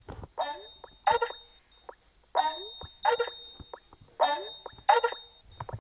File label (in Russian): skype web zvuk zvonka